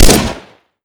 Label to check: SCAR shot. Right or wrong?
Right